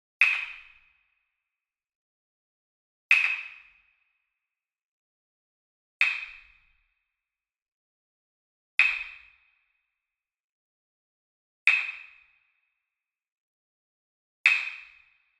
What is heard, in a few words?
WOOD PERCUSSION Wood Block Japanese Double Medium; boom library; Cinematic Strikes Construction Kit